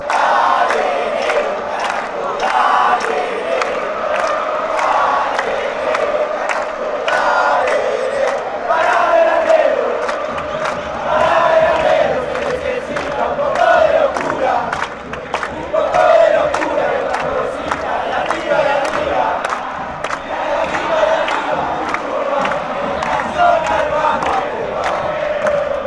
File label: patronato chant, fanchants argentina